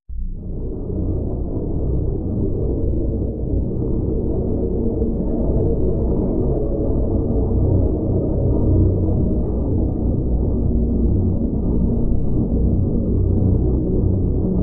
man walking along spaceship with eerie drone playing in the background